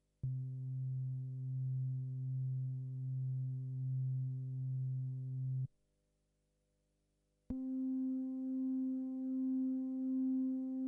kind of guitar, cs80; guitar like sound without envelope engaged: is velocity modulating the envelope's level?

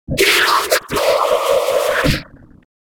vocoder

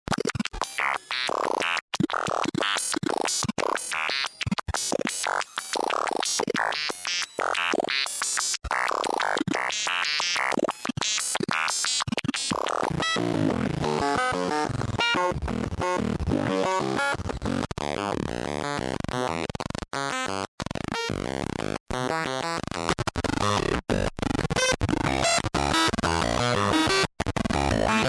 Miniwave sh; Demonstration of the Miniwave being triggered by S&H

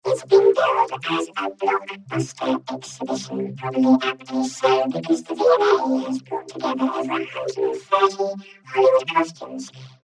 vocoder

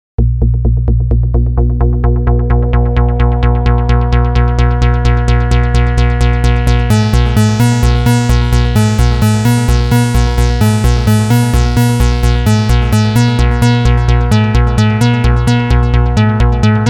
MOTM switched on

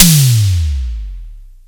Long Decay, Simmons Drums

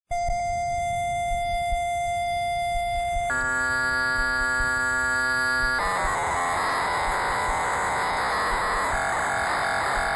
Poly 800 mk II; Korg; Patches and patch dumps

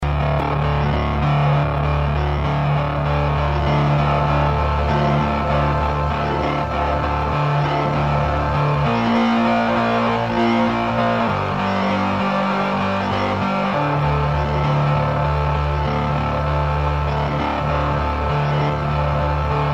beam modulator; Ken Stone Recordings; cgs synth

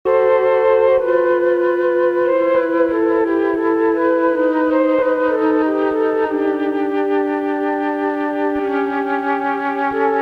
MK VI Flute noodle, Mellotron

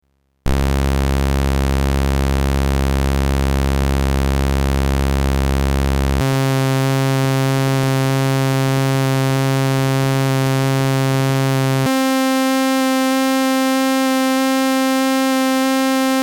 ASR slow clock; cgs synth

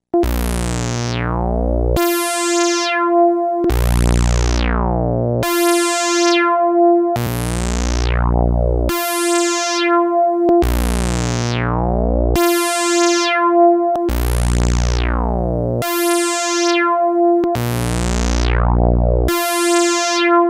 moog; John M.'s files; arrick demo

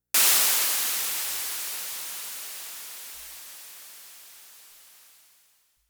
Noise SDS7, Simmons drums; SDS7 "Noise" filter wide open.

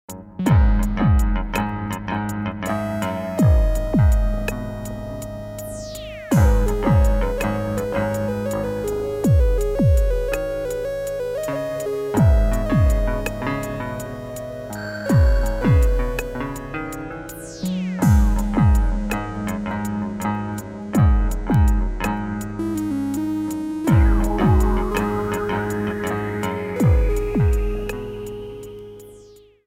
smooth lead, korg mono poly; the lead sound is the mono/poly

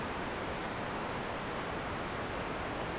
12dB, cutoff 6, res 0, jumpers 2, 5, Q150; Q150 slope jumpers

